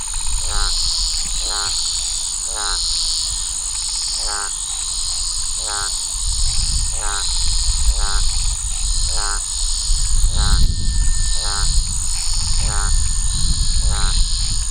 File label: Physalaemus centralis